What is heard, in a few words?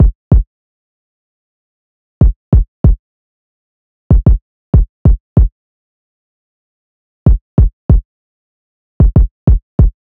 Drum Loop - 95 BPM Kick; Loop Stems; Cymatics; Vibes II Drum Loop Collection; Ultra